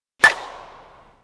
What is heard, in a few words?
lucy skill defence fer hit